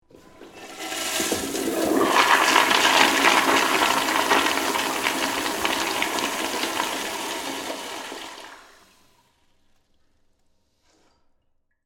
Toilet Flush Bathroom, Office, Toilet, Flush, Public, Bathroom; Public Bathrooms